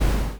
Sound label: boom